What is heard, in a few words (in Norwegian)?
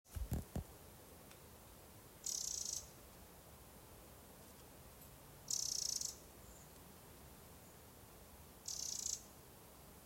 rar fuglelyd